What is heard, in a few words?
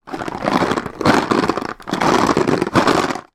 food chocolate maltesers in box lid closed tilt movements